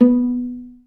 Vla Piz B2; Digital Sound Factory Virtuoso

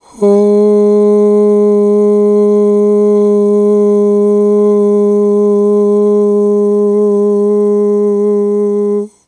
AHHH, Vocal; Polyend

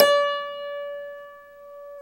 PNO, Tack Piano; Club-50 - Foundations Roland

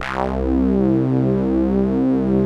ANALOG; Pro-Rec - Synthsonic

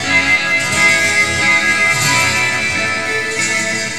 Endless Guitar, Strings; Sonic Foundry (Sony Creative Software); Myths of Technology

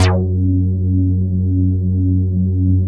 JUNO 106; Masterbits; Vintage Voltage; SEQ-FX

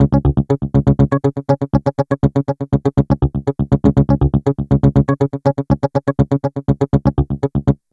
Muted Guitar, Guitar Synths; Dr. Fink's Funk Factory

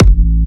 Jump Kicks, Bassdrums

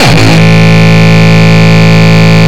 Longkick, kicks

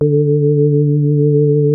KORG D3, synthesizer; Hollywood Edge; Giorgio Moroder Rare Synthesizer Collection

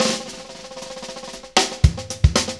Fills; AMG; Now CD-ROM (Roland)

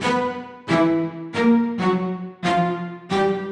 Cellos 136-E, horrorscape samples 136bpm

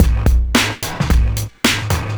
Breakbeats loop, 70_s Breakbeats; USB Soundscan vol.46; AKAI

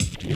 RSS, 160 BPM

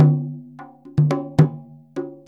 MLY DRMS; Spectrasonics - Heart of Asia CD2

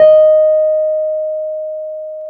PNO, Wurly

